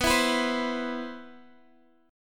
C Major 7th, B, guitar chord

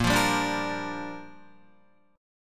Bb 6th, Guitar; strummed

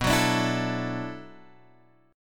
B Minor 11th B down Guitar Open G

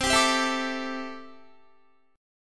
C 6th Add 9th C down Guitar; C6add9 strummed

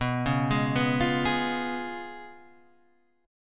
Db 7th Cb, guitar; Db7/Cb arpeggiated; JGuitar

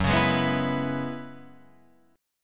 Gb 9th Suspended 4th Gb, Guitar Drop D; chordshape; down

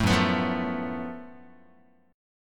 F sharp Minor Major 7th Double Flat 5th, Guitar; strummed

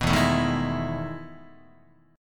E Major 11th E, Guitar; EM11 strummed; JGuitar

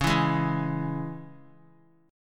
C sharp Minor, Guitar; strummed; chord; JGuitar

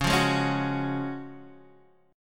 F sharp Major 7th Suspended 2nd C sharp

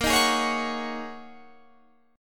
G sharp 7th Suspended 2nd Suspended 4th; chord shape; 18,18,18,18,19,21

